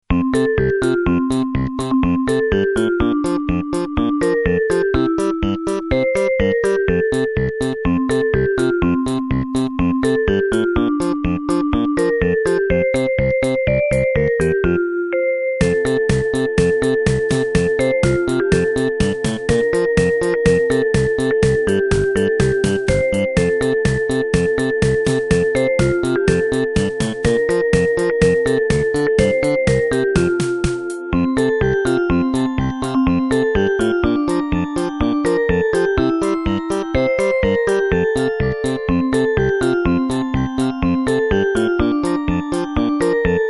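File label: jingle bells, stock game music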